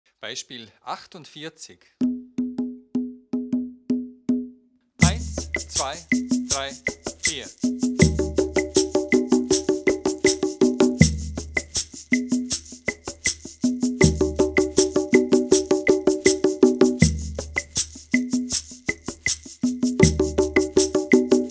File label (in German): viererrhythmus